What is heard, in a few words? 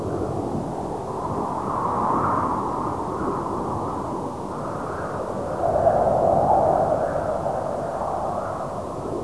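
wind desert; quake2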